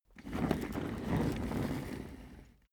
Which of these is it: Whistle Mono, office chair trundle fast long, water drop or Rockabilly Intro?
office chair trundle fast long